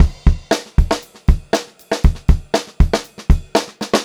Pop-Rock Drumloops 118 POP VARI; USB Soundscan vol.37; AKAI

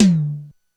HiTom, Korg DSS-1

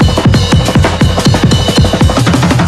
Loops

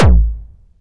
Kick, Drum Hits; Zero-G Trance Inducer; 90 s Sample CDs

